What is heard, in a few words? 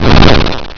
crash; crapola; ruby-rudl; rudl-0.8